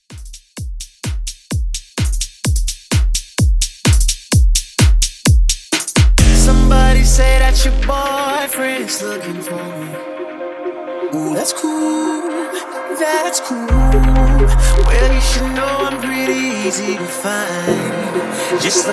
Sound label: Pop House Mix; Remixfreakz